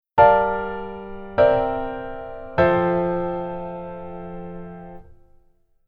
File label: Keyboard Voicing Hi